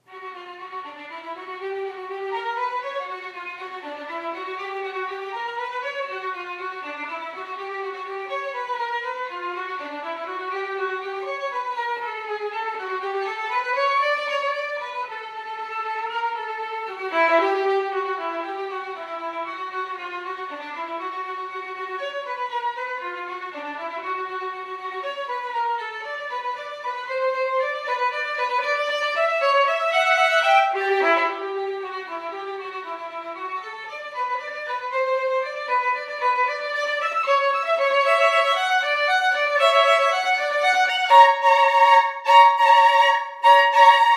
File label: violin; Schubert